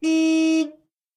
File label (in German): hupe; minecraft